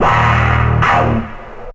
Dirty Guitar; Zero-G Jungle Warfare 1, 2 & 3

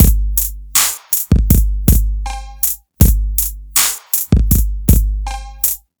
Structured Rhythm, IDM Rhythms

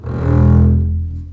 marcato, String; East West; The Ultimate String Collection

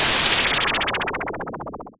Synth FX

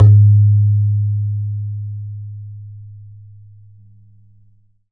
BAYAN, Drums Percussion

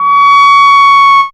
f#, Analogue FX, Dance Synths; Samples vol.37 - Dance Synths

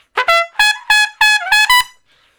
Trumpet Straight (E); Crimson Blue and Fabulous Horncraft 4 RnB; Horncraft for R&B; Solos

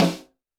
Snare, Roland TD-20

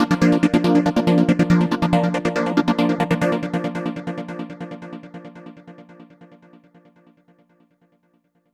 Chord Seq; Dr. Fink's Funk Factory

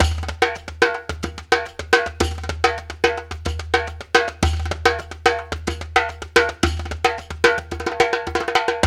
108 DUM, African Ethnic Drums; Spectrasonics; Supreme Beats

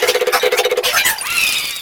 Fan Chant; Mario Paint

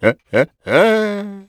Vocal Chirp, African Voices

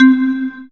synth, c, Top End Tinklers

